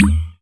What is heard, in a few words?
kadoing, percussive